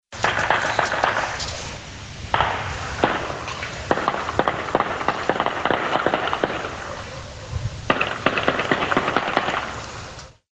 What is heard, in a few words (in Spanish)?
legua ambiente